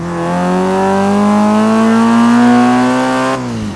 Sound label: second, vehicles; gmod; tdmcars